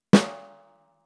Caisse claire peau avec timbre

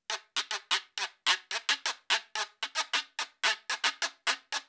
Guiro 3 avec ornementation binaire

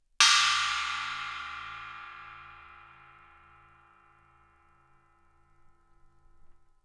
Chinoise, cymbales

